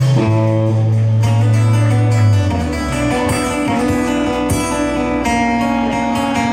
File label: Guitar strum